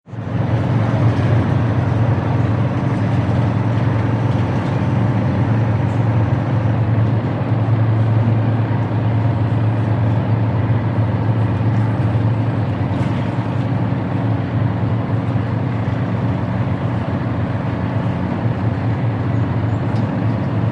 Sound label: BOATS & SHIPS BERMUDA FERRY: INT: Large diesel engines idling.